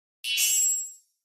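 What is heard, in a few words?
Electric Spring, multimedia element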